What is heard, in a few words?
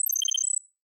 Hightech Confirm